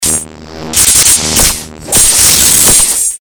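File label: future energy sword hit sequence layer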